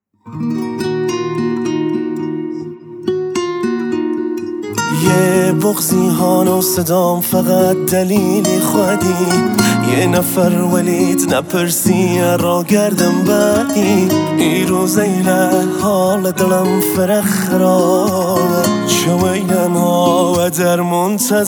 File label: Guitar Version